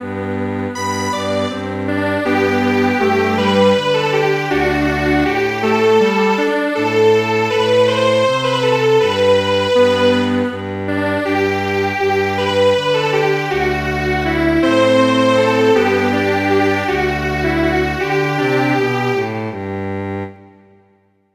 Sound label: Strings Quartet